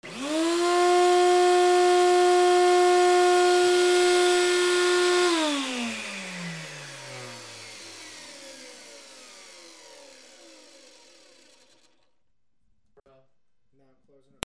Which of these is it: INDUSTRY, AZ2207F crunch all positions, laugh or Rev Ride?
INDUSTRY